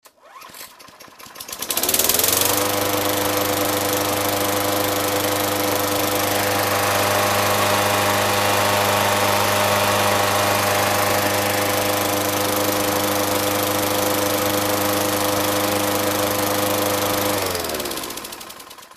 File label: Lawn Mower: Start And Steady Motor Noise.